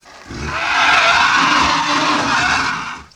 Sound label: WARN